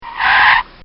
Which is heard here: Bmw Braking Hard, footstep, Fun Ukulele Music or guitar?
Bmw Braking Hard